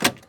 Recorder

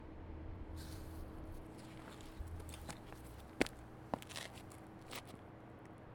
Get Up Foley

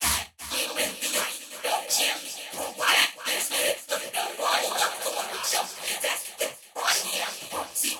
Sleeve Voc

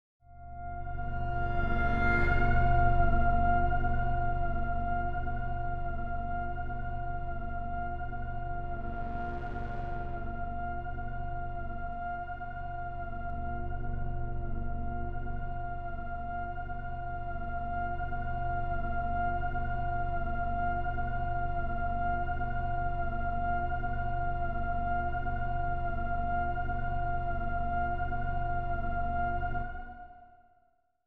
String Atmosphere B2

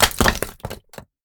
Brick Debris Fall

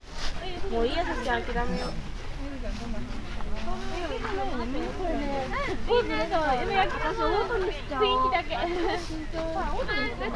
Night Shootings

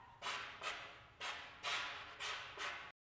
Growl wahwah

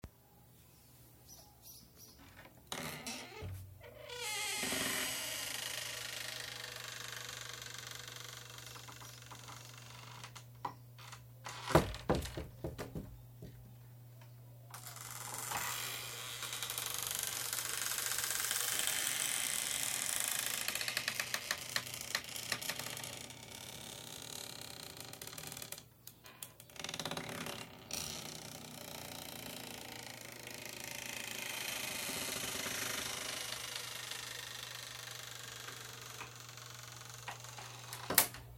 Creaking Door

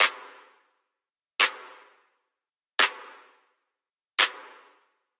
Synth Clap

